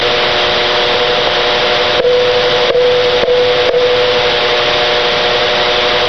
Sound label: Snapping 40 Feet